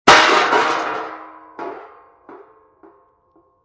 Metal Crash On Cement Heavy, Impact, Metal Crash On Cement, Large Heavy Metal Object Thrown Onto Cement Surface